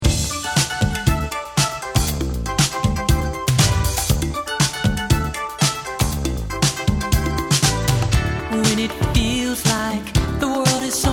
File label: Jazz